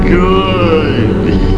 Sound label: menu command; emperor